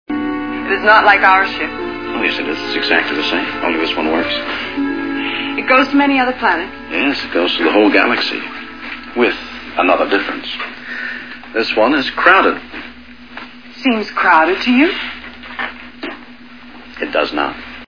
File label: crowded